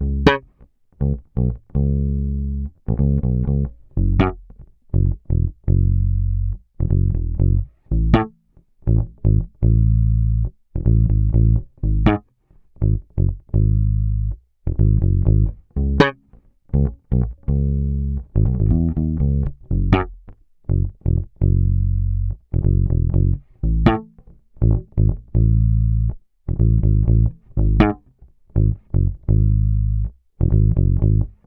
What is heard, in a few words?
Bass loop Slapper 122 C#, Electric Bass Loops Direct; Slate Digital BASSHOLE Sample Pack